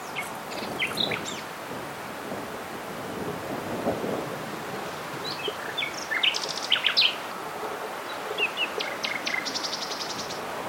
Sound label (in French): rossignol philomele